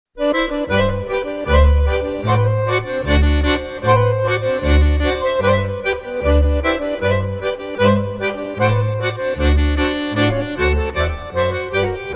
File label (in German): Stämpfli-Polka